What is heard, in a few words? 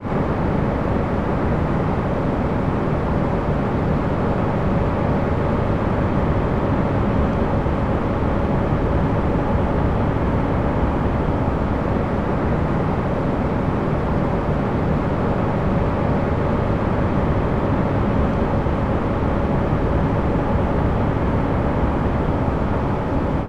air conditioning unit hum drone close up; household; zapsplat